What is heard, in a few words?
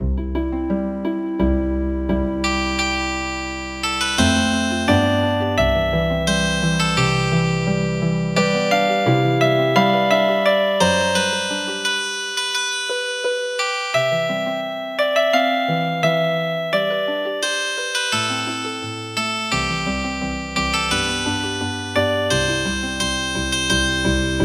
Healin Harp